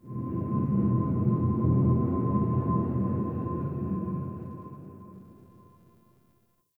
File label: Crp Ship Energy Low Texture Large, Corpus Ship Ambience